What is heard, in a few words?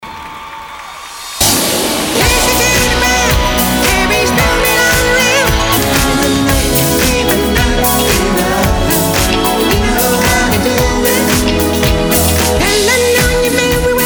Tribute Band; Las Vegas